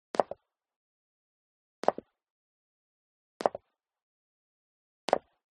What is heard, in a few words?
STEP High Heels Concrete Single Stair Down; FOLEY; smartsound